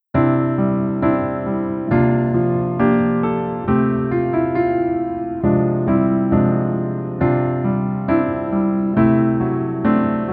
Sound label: Piano ver